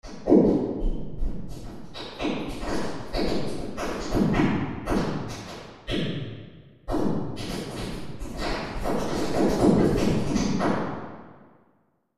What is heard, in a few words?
Large hall dark; .mp3 file - wet = dry - 26.7dB*; freeverb